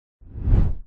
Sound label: whoosh passby right-left rumble roar muted; skyclad sound